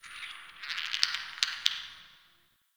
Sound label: Sentient Lair Magnet Chatter; Warframe